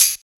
sparkly hat sound e key, high-hat sound tuned to the E note. Loudest frequency: 8044Hz